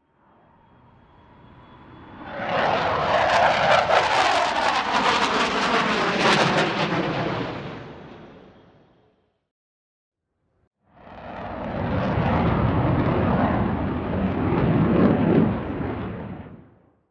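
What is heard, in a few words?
Plane Dh110 Sea Vixen overhead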